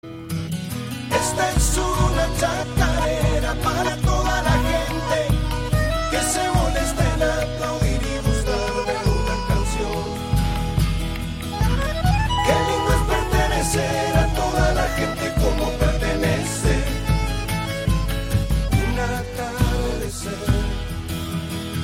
Esta es una chacarera

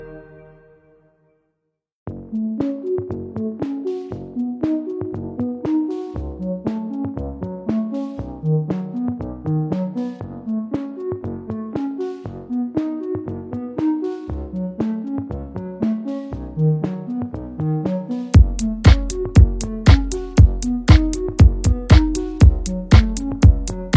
MUSICA DE FONDO

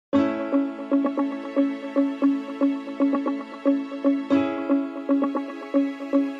Musica Corporativa

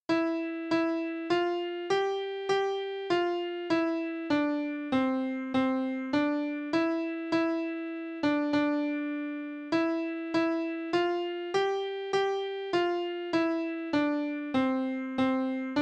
HIMNO A LA ALEGRIA PIANO

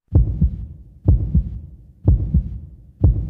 latidos

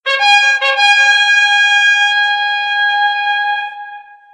trompeta de la realeza

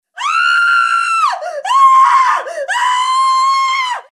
mujer gritando

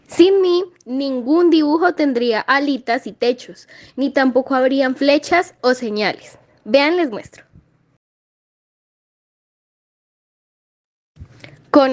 triangulo

